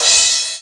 Misc Cymbal, EMU PX-7 Drum Machines

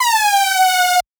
Lead - F; 99Sounds; Monosounds; Music Loops; Instrument Oneshots; Leads

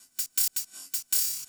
LOOP PC, 160 BPM; Ueberschall; Drum & Bass Flash Trackz

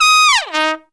TRUMPET FX; Zero-G; Phantom Horns

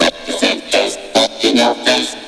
Space voice; Urban Shakedown

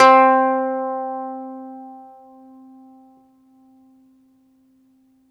BALALAIKA, Prima Balalaika, Plucked Strings

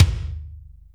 Kick, Akai MPC-500; Toolkits; Kick TKit

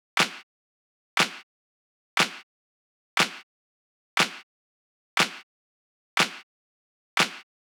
Clap, 120bpm; Zero-G; Nu Disco; Nicengroovey C

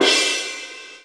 CRASH, Drums Dance & Groove; USB Soundscan vol.11; AKAI

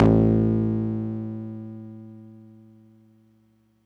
PAFMBass a2, Yamaha YX81Z

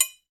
TRIANGLE, Latin Hand Perc; E-MU Producer Series Vol. 2; More Studio Essentials; Composer

